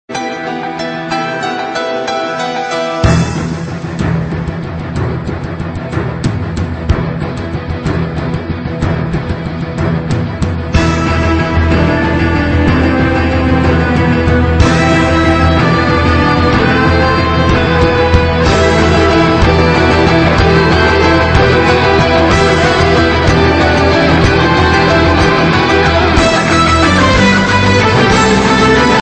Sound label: electric guitar drums; carol of the bells